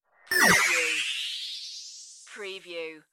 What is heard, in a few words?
Digital Noise Wave Smash Remix